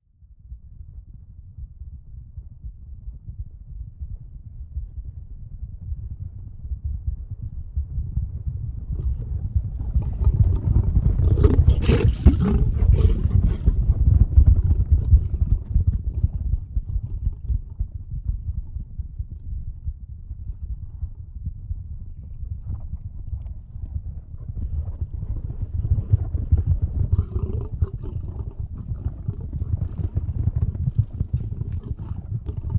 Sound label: Rhino run